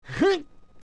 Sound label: Yawn; Link yawning; Adult Link